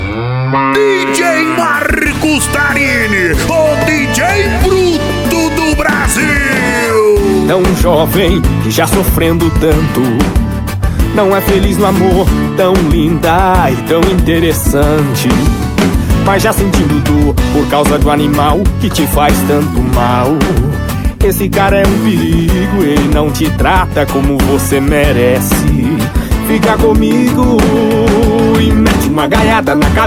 sertanejo; balada g4

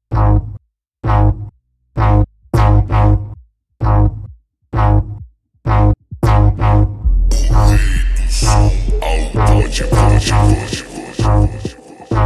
eletro funk

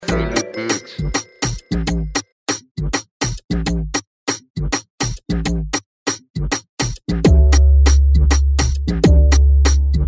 mega funk bass

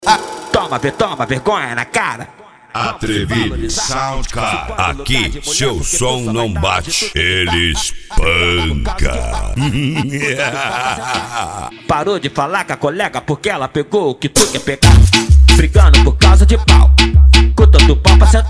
funk mega bass

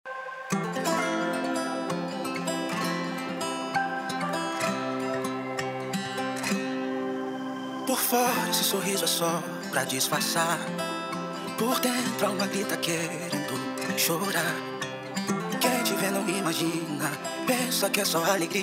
remix gospel

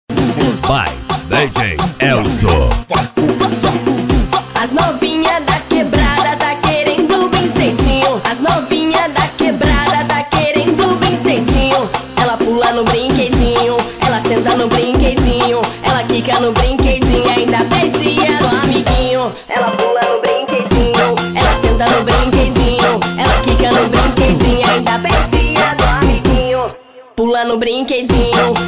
funk; equipe aloprados som altomotivo

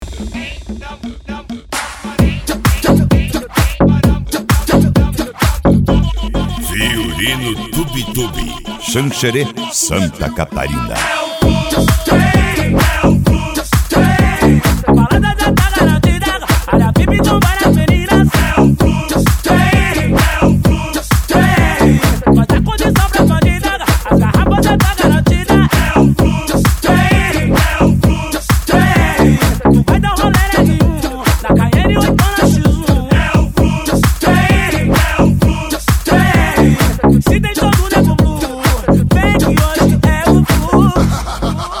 retro

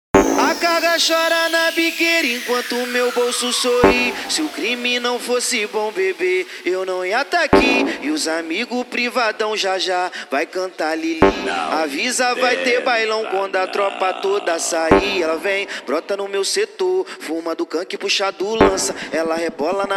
mega funk

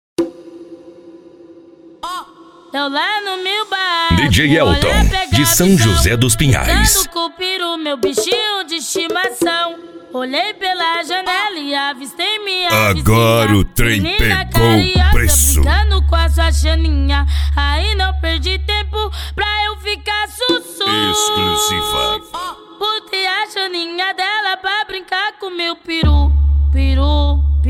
mega funk; gol destroyer

